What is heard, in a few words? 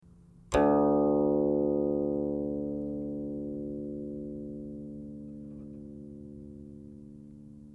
guqin; chuYinKouXian